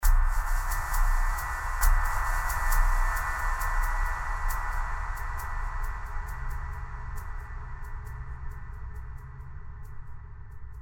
Eerie Musical

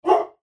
dog bark

